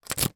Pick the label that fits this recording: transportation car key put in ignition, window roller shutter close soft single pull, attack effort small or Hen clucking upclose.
transportation car key put in ignition